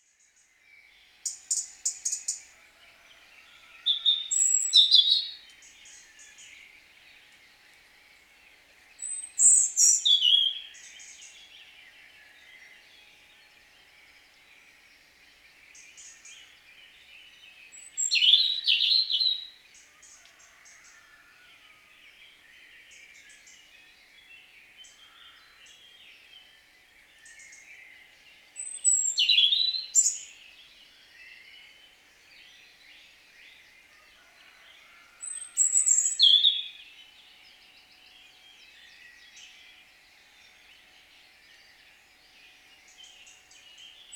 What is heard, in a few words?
Erithacus rubecula; SETE